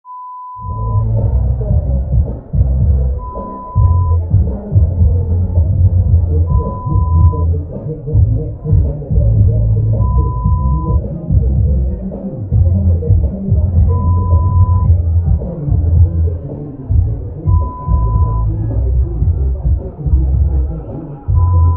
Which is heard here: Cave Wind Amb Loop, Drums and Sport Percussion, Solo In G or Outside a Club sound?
Outside a Club sound